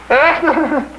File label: Eeyaa; charade